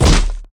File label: hit; DayZ-Epoch; dayz sfx